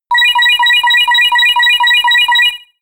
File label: jackpot win